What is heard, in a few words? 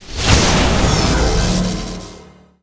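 Ghost Attack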